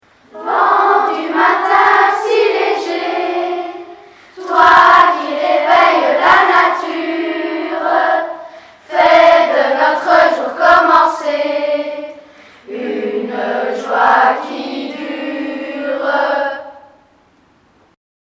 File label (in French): vent, chansons